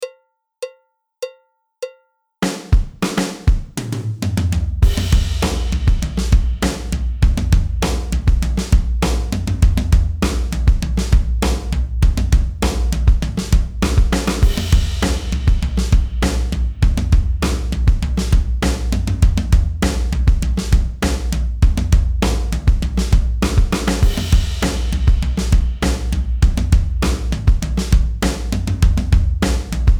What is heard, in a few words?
rock tom groove, drums